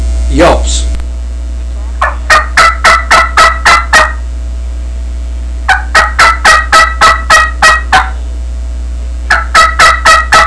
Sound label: challenger yelps, turkey; Southland Sounds